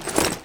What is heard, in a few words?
Pickup Armour Flak Vest; Pickups